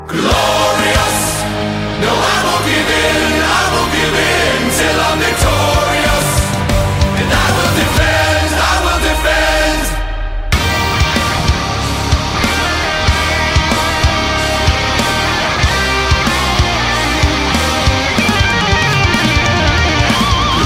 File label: Glorious Loop